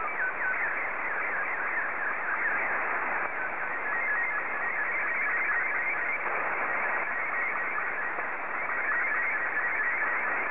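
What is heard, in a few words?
SSTV, Martin 2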